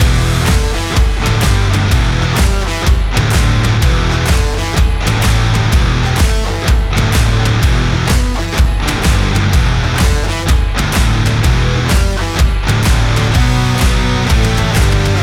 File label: Library Rock; Audio Animals